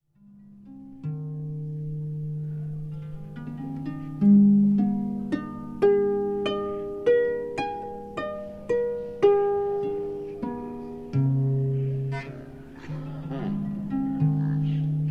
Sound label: Harp Playing Exterior, Background Crowd Chatter; Strings; Musical